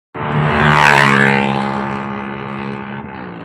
spatial bajaj passing

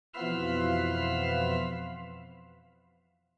wrong note

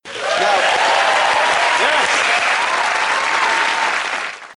applause crowd cheering

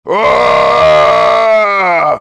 scream; serious sam

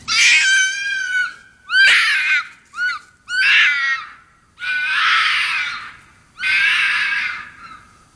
fox screams

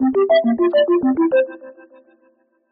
snap call

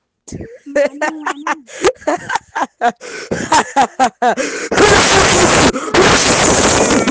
laugh

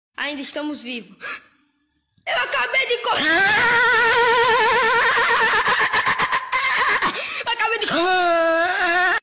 horse laugh

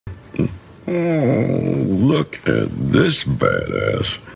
follower alert

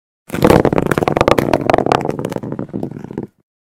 spatial metal ball rolling

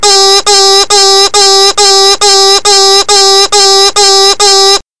annoying beep

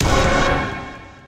orch hit; undertale

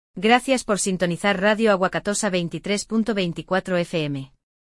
outro radio

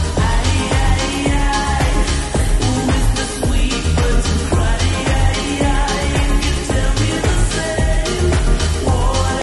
ayayacry

